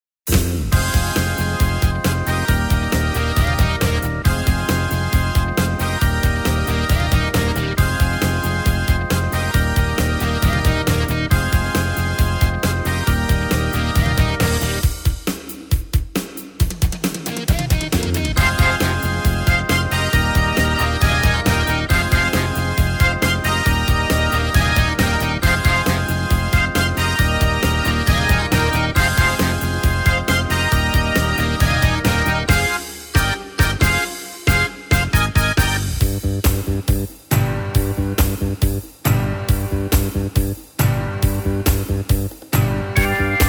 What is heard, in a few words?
Rock Oldies Medley; bonmidi music